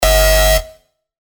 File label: Synth Shot G; synth shots tech house; Amoeba; revealed